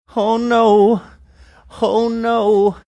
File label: singing oh no oh no